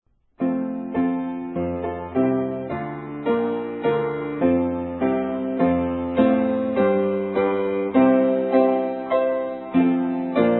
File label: winchester old, hymns